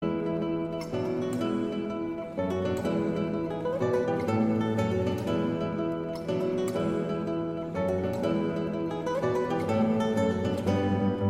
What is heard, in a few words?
Celtic, guitar